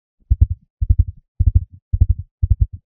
horse dart

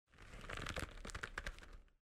pulling arrow

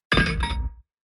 Door bell ringing

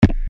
UI invalid sound

